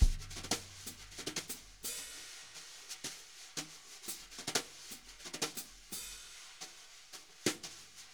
jazz brush loops

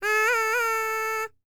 MACEDONIAN, World Voices

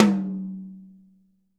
HITOM, drum

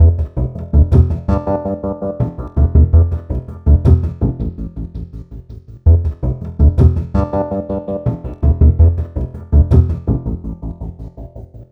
Remixed Acoustic Bass

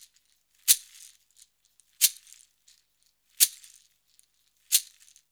089 FREES, Modern Percussion Loops

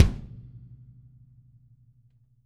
kick

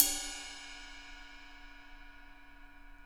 ride hi; Sonic Reality

